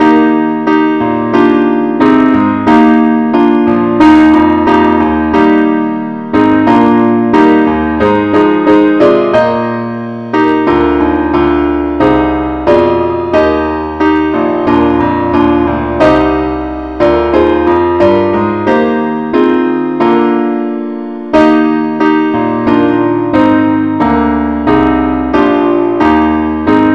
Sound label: keyboard only, key ees; christe Sanctorum